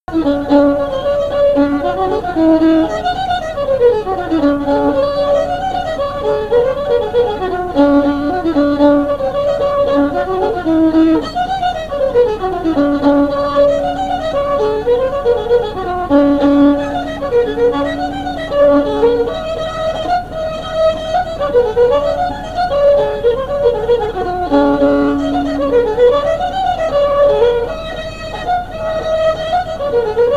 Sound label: fiddle; bottom of the punchbowl